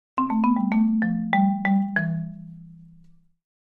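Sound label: Marimba Accent Short; mallets marimba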